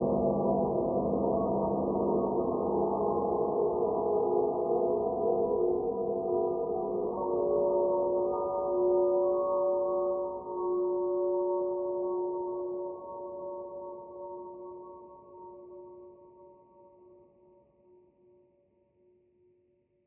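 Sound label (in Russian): жуткий музыкальный бокал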